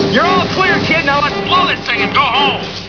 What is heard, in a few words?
blow